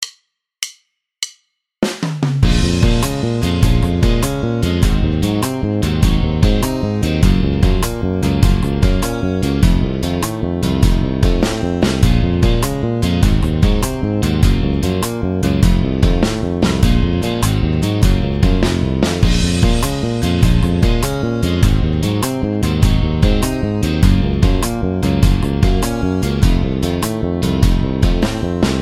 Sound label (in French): main gauche exercice, basse; exercices